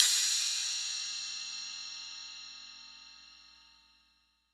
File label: crash; eddyflux